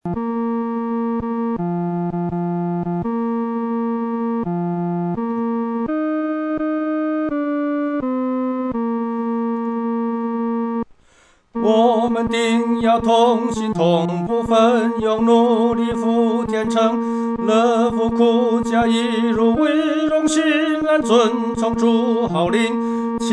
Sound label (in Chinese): 独唱 第三声